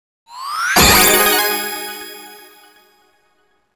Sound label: GOAL FANFARE